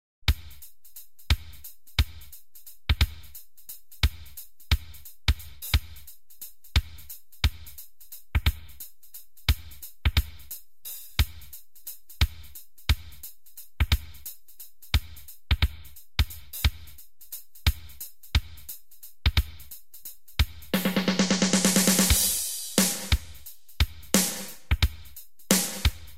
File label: Drum solo, stereo